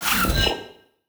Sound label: Glitch